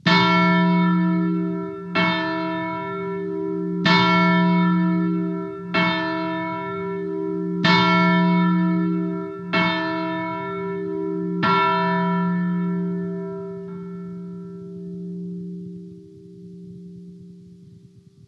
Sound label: Bell Ring; Bellmaster